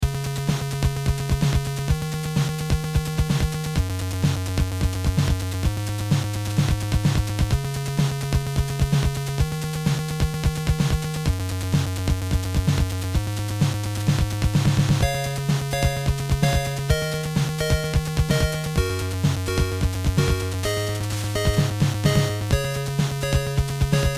beat 64

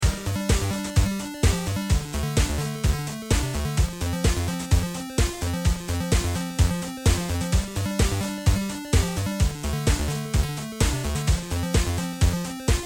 beat 100

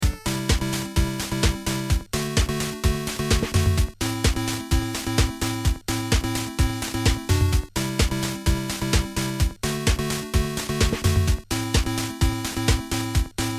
disco beat

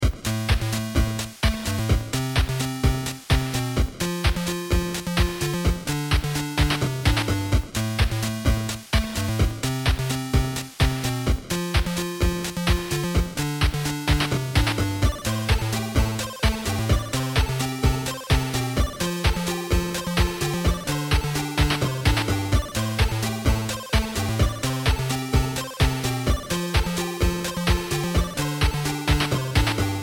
beat 29

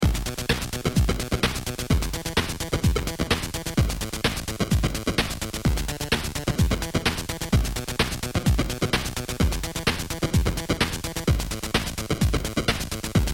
beat 34

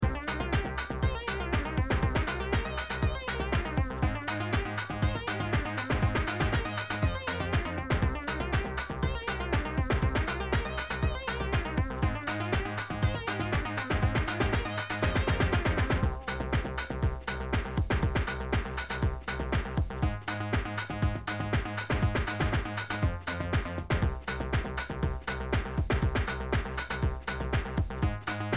bass basstone lead sound beat

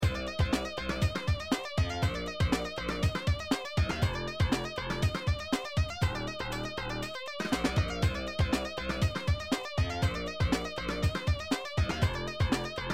bass basstone lead sound beat

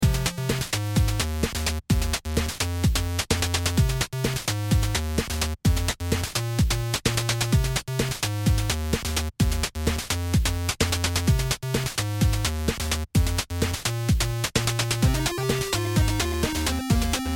beat 89

